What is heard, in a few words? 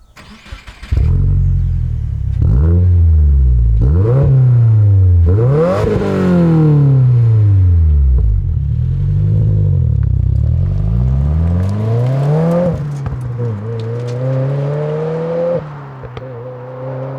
soundcheck